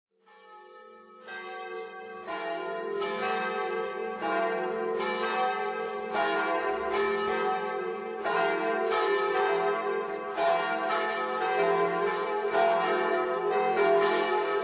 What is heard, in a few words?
Veni Creator campanas; Hymnus VENI CREATOR SPIRITUS